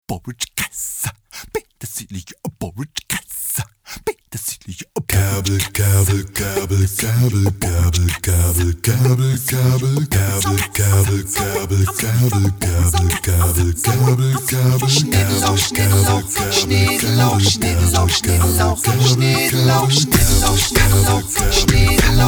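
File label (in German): gesang grüne soße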